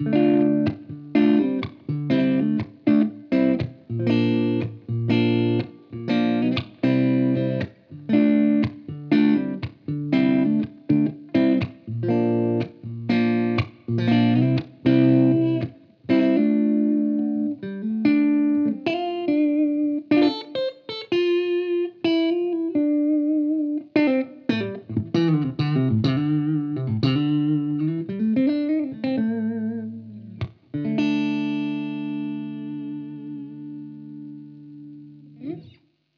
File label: Blackstar St. James Comb USB; Architect Software